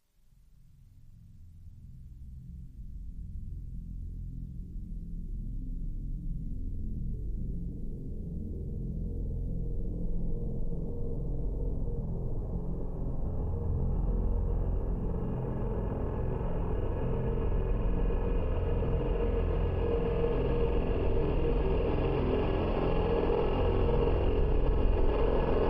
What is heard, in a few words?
game music theme